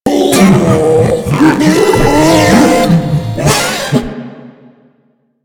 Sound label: Proto Infested Ancient Small Arm Stun Scream; Enemies; Abilities; Warframe